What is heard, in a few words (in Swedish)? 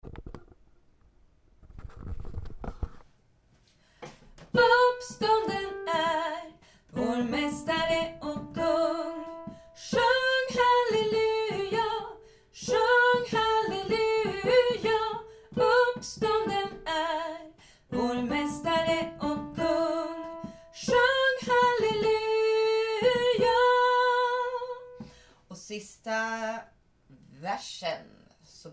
uppstanden sopran